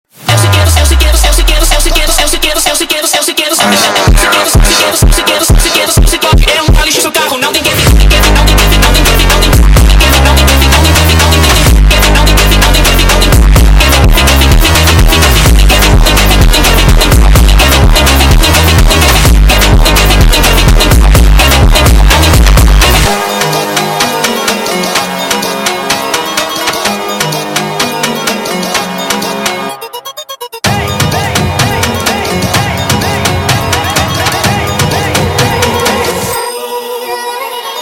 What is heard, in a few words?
Funk sped Up